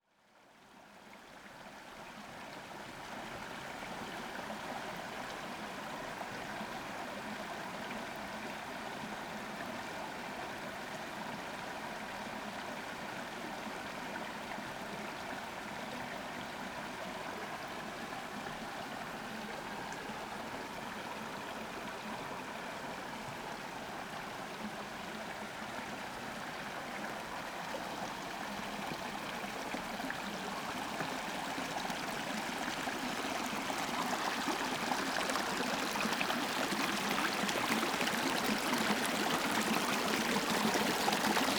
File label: Above Small Stream Linhope Spout, Northumberland, UK